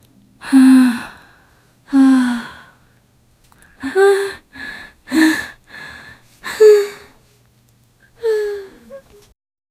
lady in pain waking up

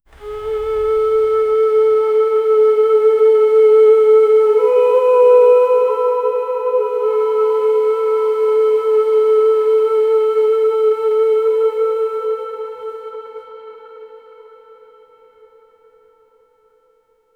soft flute veena in; celestial

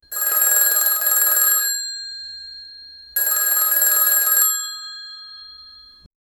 old phone ringing, periodic

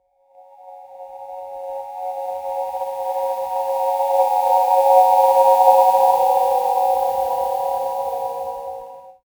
transition soft a; lo-fi

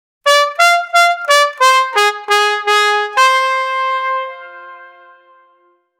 trumpet roman army; brass instrument

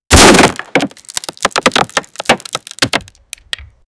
wood

